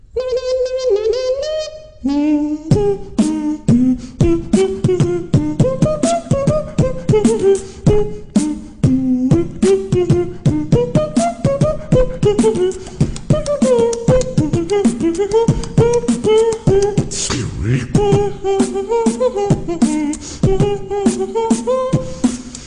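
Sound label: Music Box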